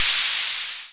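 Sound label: snare drum, Boss DR RHYTHM 55; DRUM MACHINES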